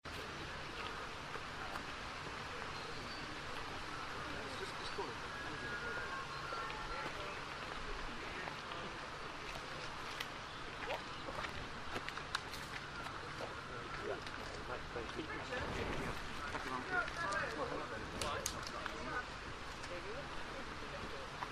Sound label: strange noises from boat on the thames then laughing bird